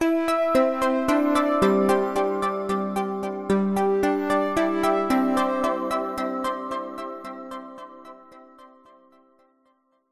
musical big ben chime synthesized retro synth bells; zapsplat